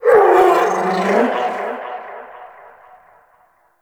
Vocal Captured Lift Away; Warframe; Predasite; Hunting Critters; Characters

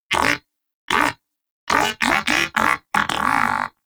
Moa Pet Tenacious Skip Rope; Pets; Warframe

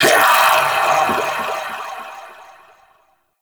Vocal Death Dissolve; Warframe; Duviri; Dax; Ashigaru

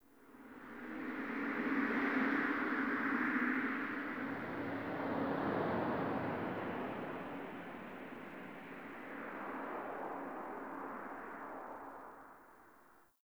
Dark Wind

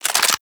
Lex Clip Out; Warframe

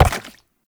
Step Goo; Warframe; Uriel

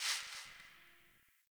Garbage Plastic Bag Rustle; Warframe; Ambience; Nineteen Ninety Nine

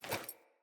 Foley Action Default Sword Throw Wind Up; Player

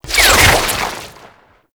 Ink Rifle Alt Whizz By, Weapons; Warframe; Tenno

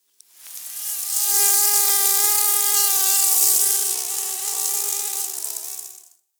Forest Close Insect Buzz Close; Warframe; Grineer

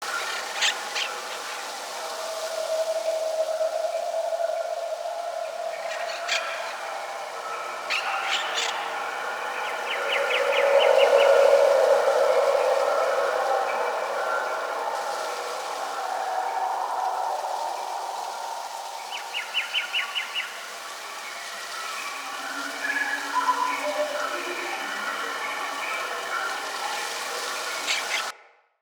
Day Amb Loop, Ambience, Glades; Glades Of Andurin

